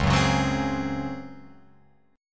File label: Db Major 7th Suspended 4th Db, Guitar Open F, chord; strummed; JGuitar